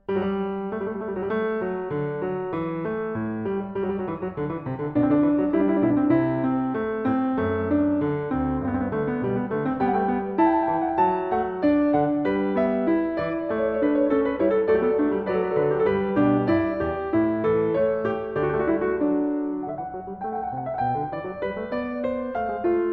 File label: Clav.; Stereo; Goldberg Variations, BWV 988 Variation 10 a 1; Fughetta; Bach